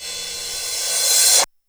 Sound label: Rev-Cym; Roland R-70